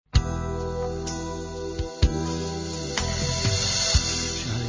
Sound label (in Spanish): solo musica